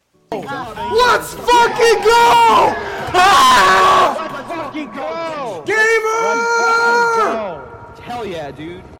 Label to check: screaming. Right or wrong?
Right